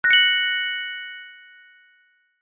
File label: fantasy magic chime ping wand fairy godmother